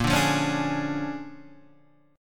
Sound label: Bb 7th Sharp 9th Bb, Guitar Standard; Bb7#9; strummed; JGuitar